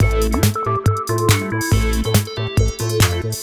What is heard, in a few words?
teckno; Google; NewWave Labs